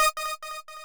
lead d#5

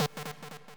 sub lead d2

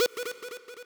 sub lead b3

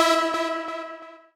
lead e4

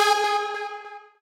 lead1 g#4

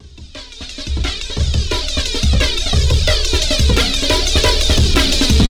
amen fadein

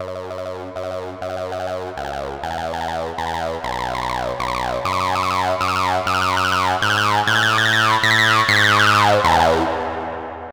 bass fill

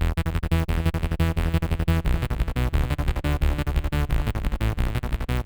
bass arp

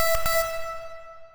fami e3

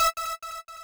lead e5

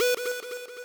sub lead b3

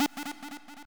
sub lead d3

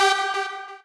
lead1 g3

